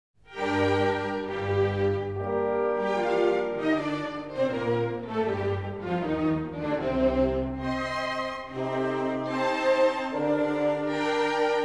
Requiem in D Minor, K 626 V. Sequentia Rex tremendae majestatis; Mozart, Wolfgang Amadeus